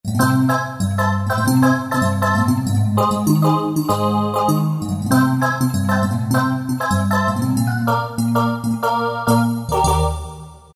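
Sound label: Percussion AAAH; Roland PN-D50-02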